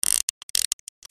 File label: SCIFI